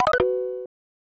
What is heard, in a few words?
Break Wall; sanrio world smash ball 1993 snes